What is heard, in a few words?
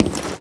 player grate